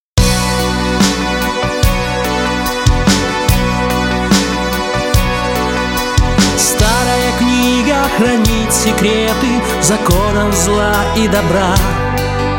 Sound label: rock